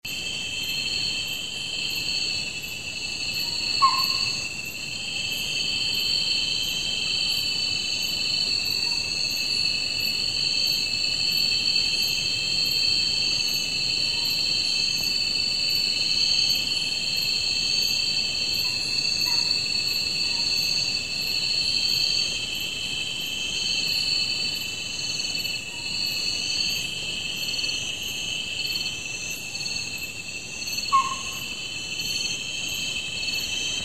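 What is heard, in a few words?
metaphrynella pollicaris, Malayan Treehole Frog call; Fraser's Hill, Peninsular Malaysia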